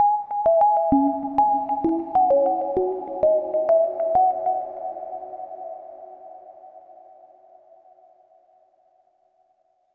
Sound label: Overlay Tone